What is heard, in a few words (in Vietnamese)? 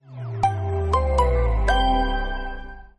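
Nhạc chuông khởi động samsung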